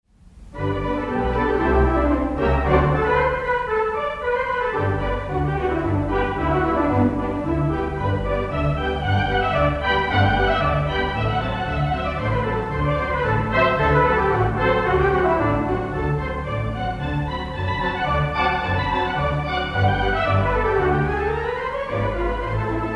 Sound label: Polka de Concert, Concertorgel